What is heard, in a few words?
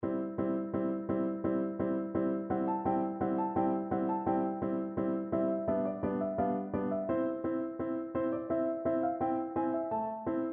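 electric piano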